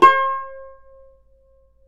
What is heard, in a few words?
yayli tambur; Guitars; Spectrasonics; Hans Zimmer Guitars VOL-2